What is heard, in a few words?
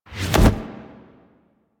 Camera Latch; Warframe; Chimera Prologue